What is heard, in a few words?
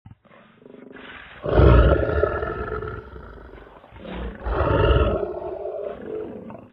lions; animals; Duplo Zoo